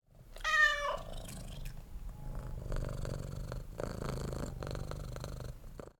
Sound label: animals cat meow; mono; 96khz; sonniss gdc